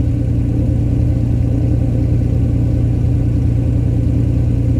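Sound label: stuka cockpit left, engines; War Thunder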